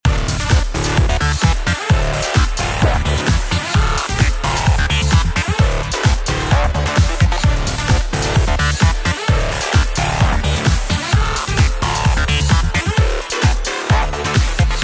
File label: theme arena